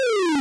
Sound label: Old Video Game